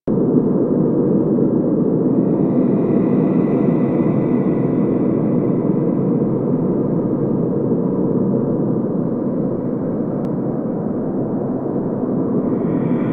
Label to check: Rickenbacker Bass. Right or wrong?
Wrong